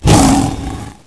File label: pain, hurt; predator